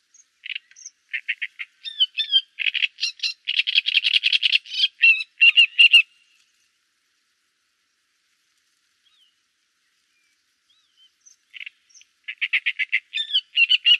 trzciniak grote karekiet zang; peter boesman

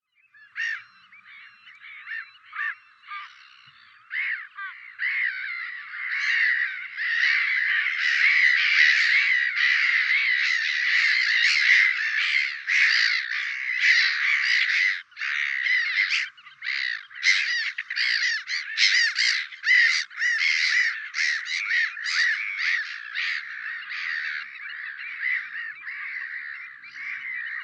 mewa smieszka kokmeeuw roep; peter boesman

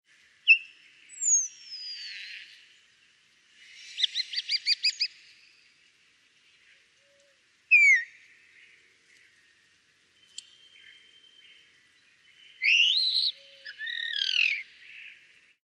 szpak, spreeuw zang; peter boesman

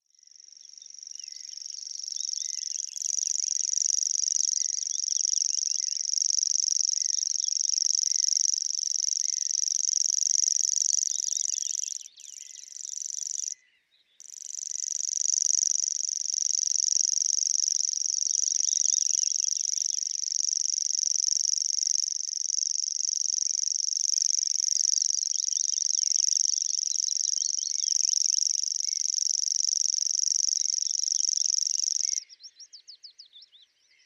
swierszczak, sprinkhaanrietzanger zang; peter boesman

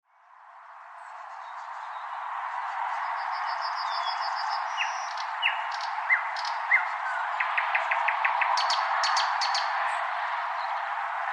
słowik szary; eetu paljakka

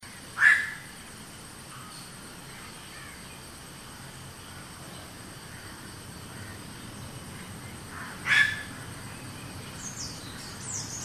slepowron night heron; dilip kg

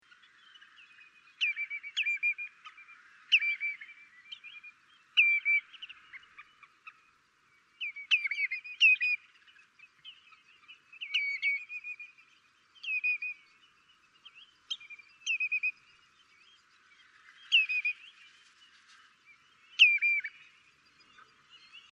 krwawodziob tureluur roep; peter boesman

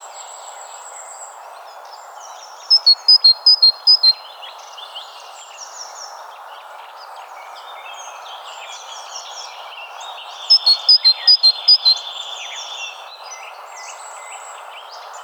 bogatka; johannes buhl

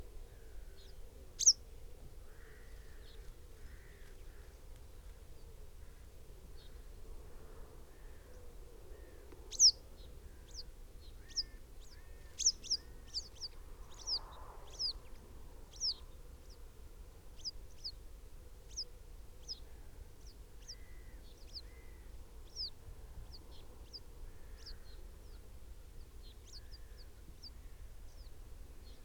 pliszka zolta; david m

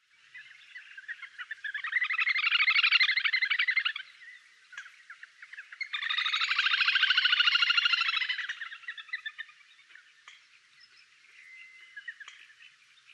perkozek dodaars zang; peterboesman

